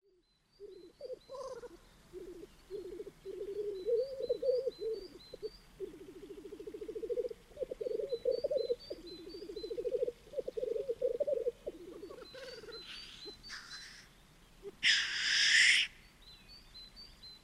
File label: mystery sound, Animal; british library; Friday mystery object #235